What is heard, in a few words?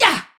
Attack Effort; Warframe; Tau; Dax; Ninja